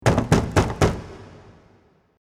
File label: Door Knock